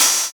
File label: Open HH, Cymbals